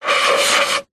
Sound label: human blow air on metal tube end rim; zapsplat